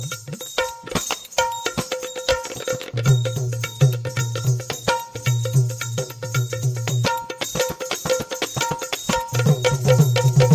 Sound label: marathi dholki tone